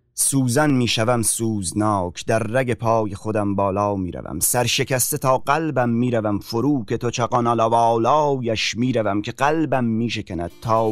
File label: Improvisation